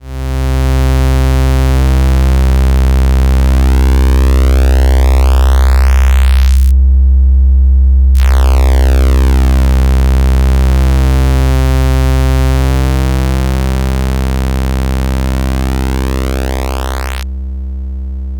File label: uniCycle Sinoid